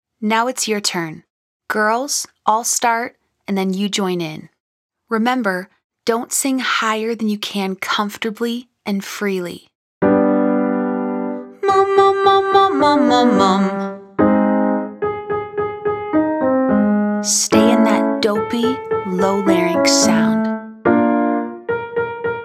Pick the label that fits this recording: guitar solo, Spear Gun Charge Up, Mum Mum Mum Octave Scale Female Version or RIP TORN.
Mum Mum Mum Octave Scale Female Version